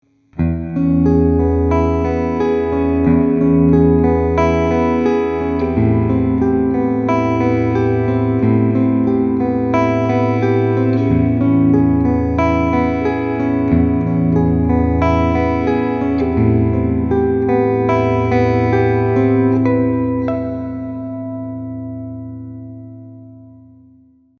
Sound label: ibanez tm1803m talman prestige clean picking neck and full middle